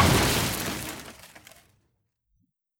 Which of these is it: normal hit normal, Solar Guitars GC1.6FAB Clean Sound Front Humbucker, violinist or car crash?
car crash